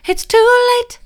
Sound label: it's too late soft, vocal